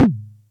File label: Music Percussion Computer Tom, Drum Machines